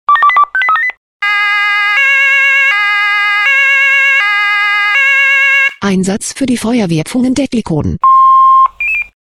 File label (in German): SMS Alarmierungstone; FW Pfungen